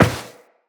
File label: Step Metal, Footsteps Walk; Tenno